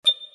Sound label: message ticker; waze